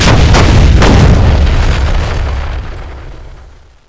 3 cannons; bunkerhill

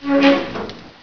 stuka door

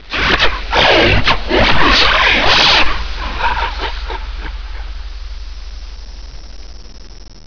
ghost; geist

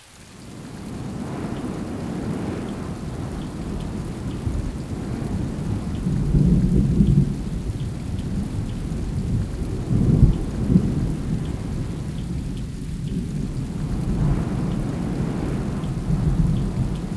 wind rain

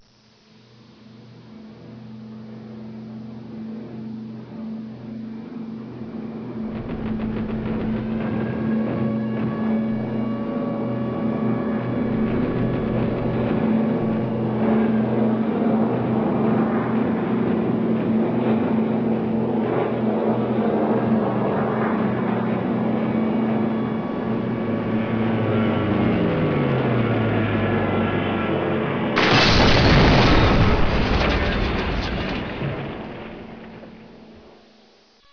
fly by crash

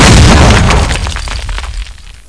explode

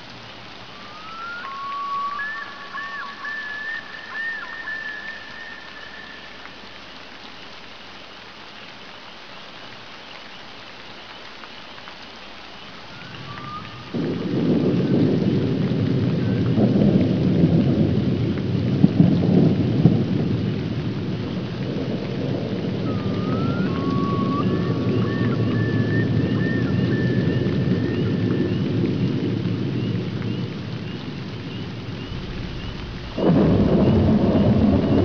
thunder; geist

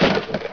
wood, debris

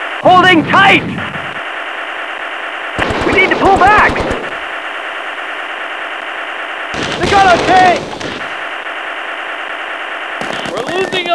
bulge radio

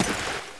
player slosh

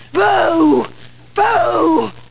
boo

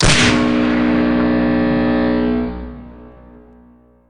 start

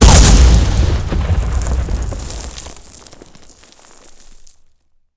mortar hit, weapons

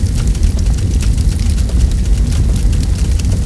large fire; tobruk

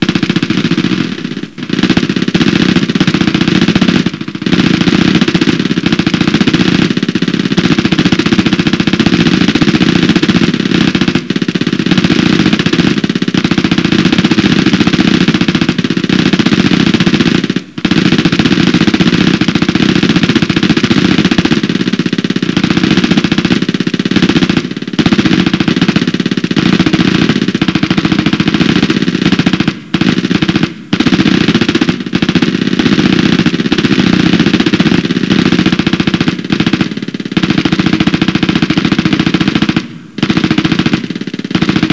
dog only gunz

